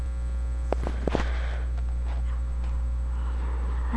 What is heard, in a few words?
rake